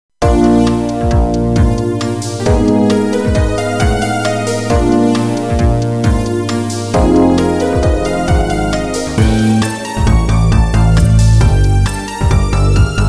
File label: crime time synth band